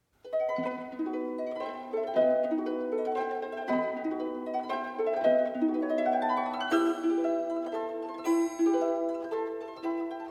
Harp Concerto in E Minor III. Scherzo - Finale. Allegro Vivace